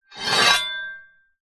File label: sword slow scrape on metal or other sword